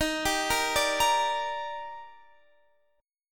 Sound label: D sharp Major 7th, Guitar Drop D